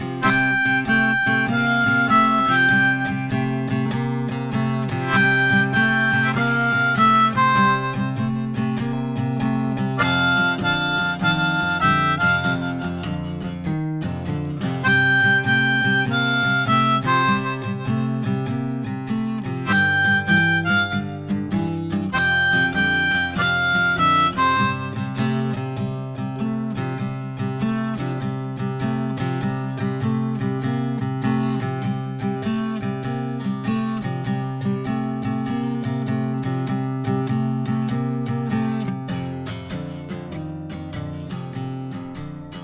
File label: Easy Blues Exercise In C, 1st Position, harp